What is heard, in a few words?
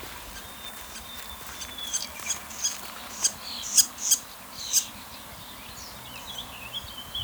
mystery bird call, Heard on a meadow.